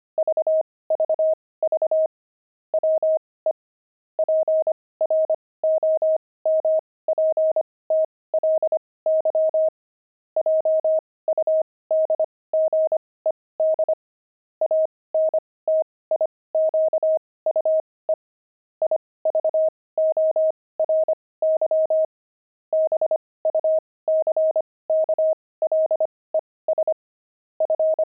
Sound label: pangram, intermediate pangrams files